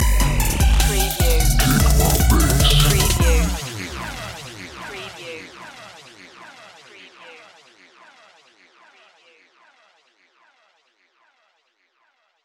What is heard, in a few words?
kick my bass stinger